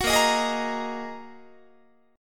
A sharp 7th Suspended 4th Sharp 5th A sharp, Guitar; strummed; chordshape; 21,18,18,18,19,21